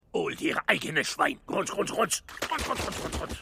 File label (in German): Schwein; Futurama; Hulu